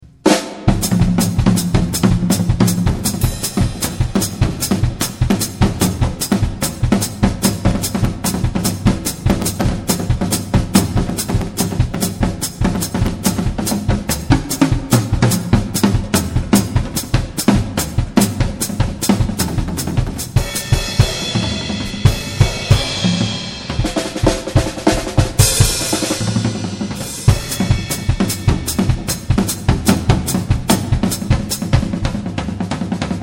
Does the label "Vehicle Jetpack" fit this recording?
No